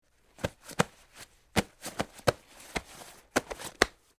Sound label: Body Pats Frisk, cloth, pats